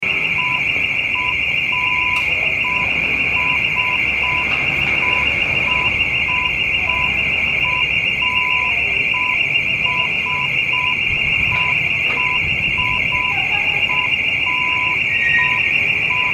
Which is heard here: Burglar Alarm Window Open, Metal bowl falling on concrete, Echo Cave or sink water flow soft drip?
Burglar Alarm Window Open